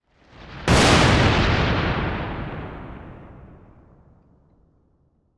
Weather Storm Lightning Bolt Crash Crack

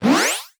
Flash Pop Up; Multimedia Internet CD-Rom Flash

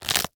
Horror Scary Bone Break Short

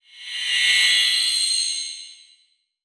Title Transition Horror Dark; Production Element

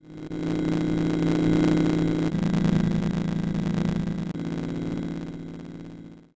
Science Fiction Sci-Fi Electronic Distortion

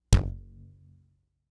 Household Rubber Band Pluck

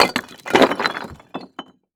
Crash Brick Smash On Pavement; crashes; AdobeCC

